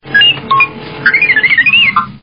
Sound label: Hit Wall